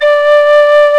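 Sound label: PENNY WHISTLE VIB; Exotic Winds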